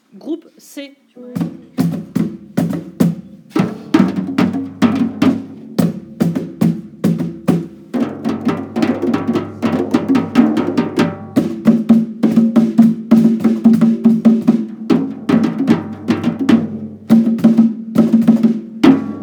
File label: batucada